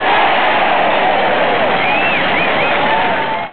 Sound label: crowd birdie; Internet 1996 World Exposition; Panasonic; funcity; paris